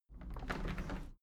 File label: window handle plastic squeak open soft; objekte; fenster